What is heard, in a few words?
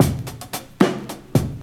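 six eight times, drum loops boombap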